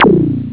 goat death

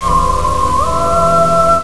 "AHH"

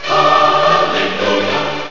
study

